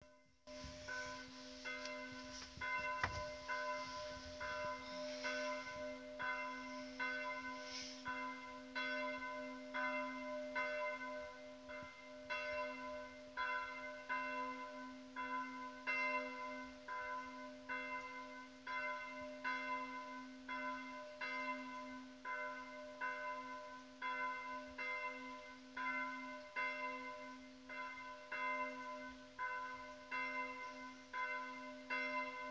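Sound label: bells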